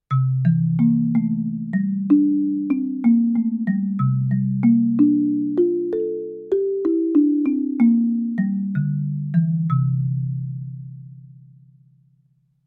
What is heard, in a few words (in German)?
BXG mit einem Schlägel; studio-49